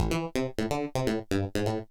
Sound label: SYNTH HOUSE 125 A, Keys; eJay